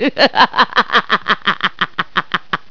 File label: LAUGH BIG; PEOPLE